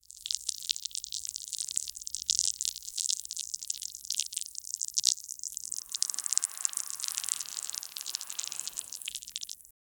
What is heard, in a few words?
venom like symbiote attac